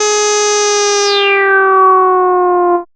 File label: g#, Analogue FX, Dance Synths; Samples vol.37 - Dance Synths